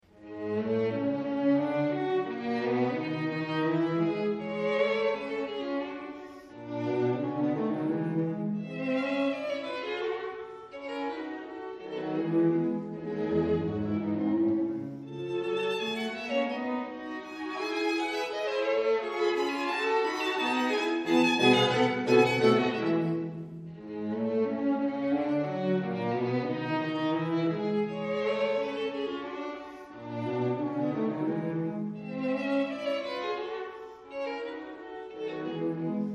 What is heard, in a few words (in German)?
Streichquartett a moll; Beethoven; Op.132